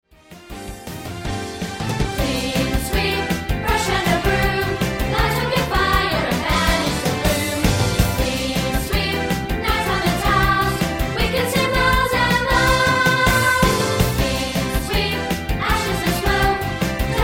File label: Clean Sweep